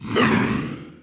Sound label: injured